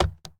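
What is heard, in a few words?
Truck Cherokee Door Open; SFX Kit; Trucks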